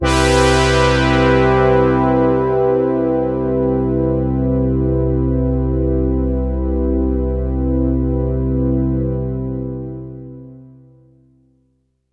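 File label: OB-6 synth brass major, synths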